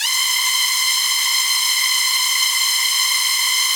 SPACE STRINGS, Yamaha VP-1; Music Store

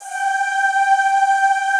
ZZZ G4, Classical Choir; Best Service; Extended Classical Choir

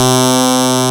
PPG 2.3; DIGITAL1 HYB

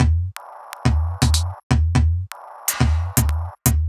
Top B 123bpm, House Drumloops; Moulin; Zero-G House Fabrik Drumloops

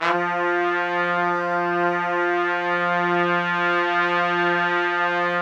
F2, Orchestral Brass; Best Service ProSamples vol.20; AKAI

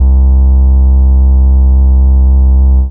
Single Electric Basses, Dance Floor Bass

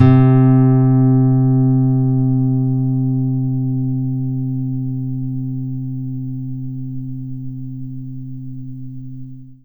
NYLON GTR

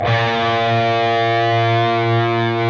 PRS lead

